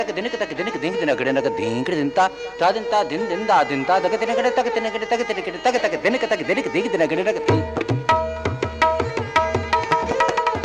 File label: Sitarkhani - Tabla